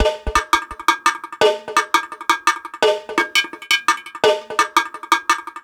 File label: Mixed Percussion, percussion loops; Drum n Bass; KJ Sawka; Drum n Bass & Beyond; Drum Tool Box